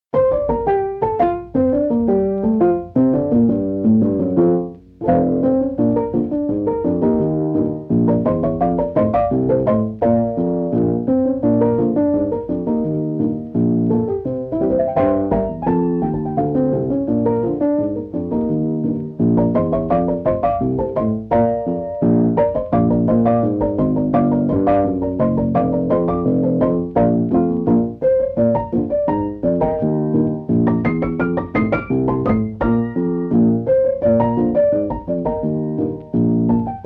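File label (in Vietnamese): đàn nhị; nhạc cụ; 46 bản nhạc cụ hay có thể phối nhạc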